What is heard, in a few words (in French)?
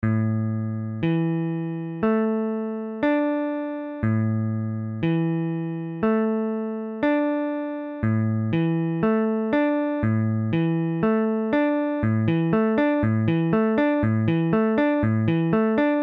Asus4, guitare